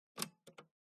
household stereo hifi tape cassette deck press play